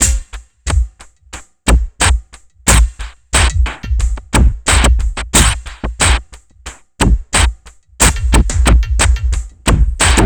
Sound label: techno; INIS; Funky Xtreams